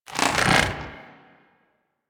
Head Pull; Warframe; Teralyst; Ropalolyst; Flight

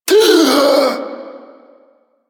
Friendly Grineer Revive Complete Gasp; Warframe; Veilbreaker; Quests; Kahl

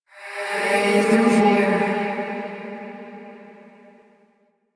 Duviri Hand Compass Vocal; Warframe

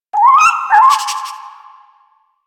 Rabbit Ambient Call; Duviri; Animals

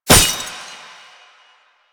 Weakpoint Impact; Enemies; Lasrian Tank; Warframe

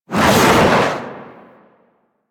Air Bombard Projectile Whoosh; Warframe; Venus; Venus Air Bombardment

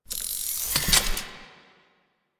Sticky Bomb Reload Ready; Grineer; Veilbreaker; Vor

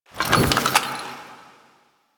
Customization UI Sel Menu; Kahl; Warframe; Veilbreaker

